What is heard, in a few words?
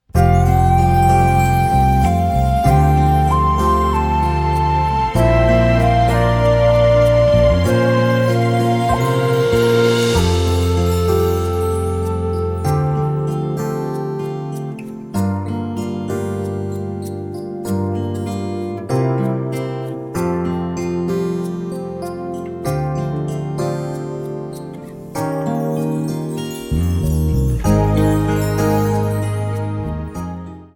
reflection f dur